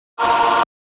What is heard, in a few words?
Melochoir